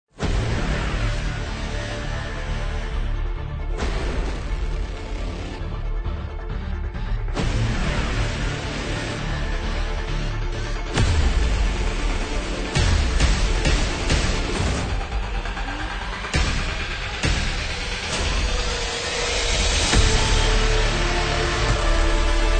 Epic

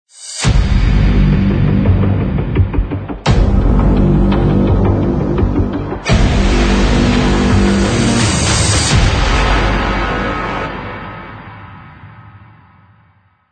Action Trailer Intro

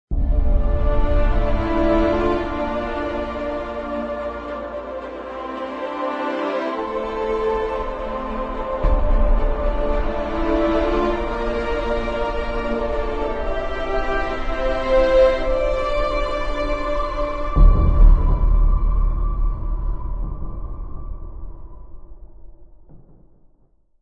Blockbuster Trailer Intro

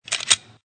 Ammo Clip

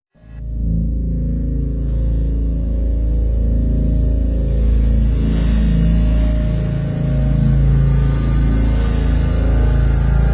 Evil Clark Key of A

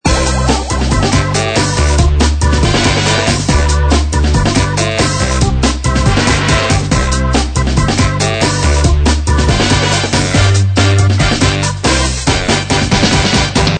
Birthday Party Loop

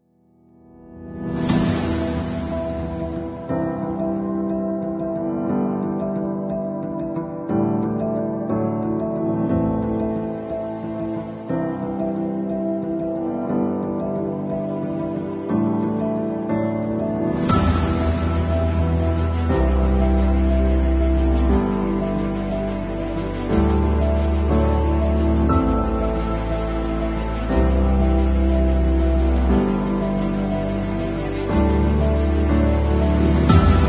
Epic with Orchestra Choir

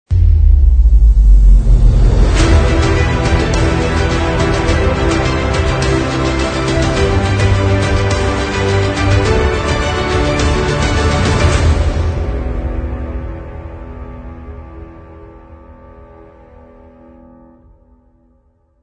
Trailer

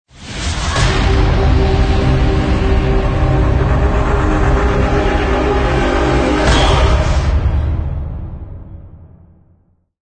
Cinematic Impact Logo With Choir

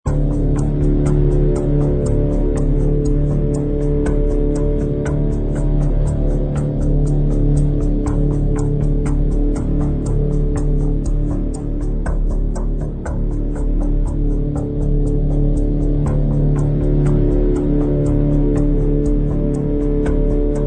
Mystical Orient

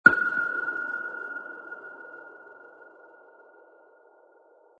Percussion Ambient Perc

